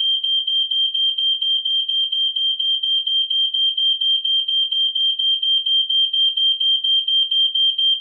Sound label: smoke detector